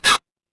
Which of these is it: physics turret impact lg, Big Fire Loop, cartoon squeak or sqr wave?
cartoon squeak